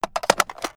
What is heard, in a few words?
struggle, m82; weapons; Urban-Coalition; Anarchy; arccw ue